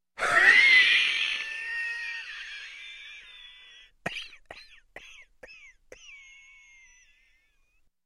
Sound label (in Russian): zvuki khripa u cheloveka